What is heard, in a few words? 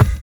TRIP KICK; Drums In Your FACE!; BONUSKIK